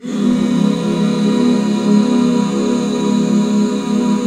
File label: Db 7; Best Service Gigapack 1